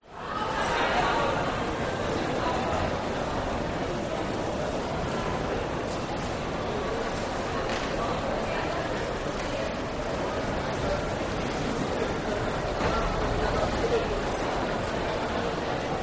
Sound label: Airport Germany Stuttgart People Walla